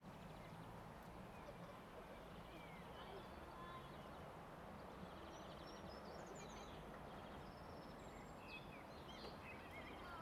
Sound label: Ambience, City Park, Distant Traffic, Voices, Birds Chirping; AmbiX; Sonik Sound Library; Spatial Cities